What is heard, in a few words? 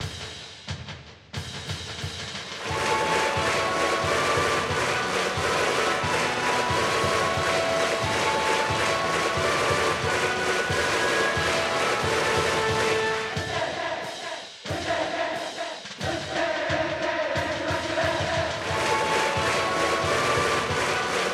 Music Ouen Brassband; ebaseball powerful pro yakyuu 2020 ps4 switch gamerip 2020